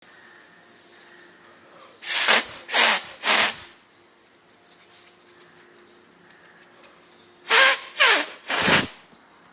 Paper Instrument

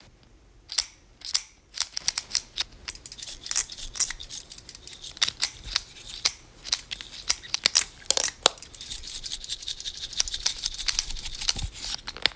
Working Robot

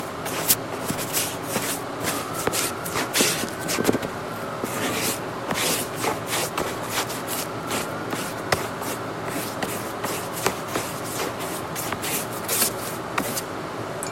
Water Brush Paper

